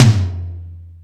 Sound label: Metal Drums, E-MU Pro-Cussion; drum machine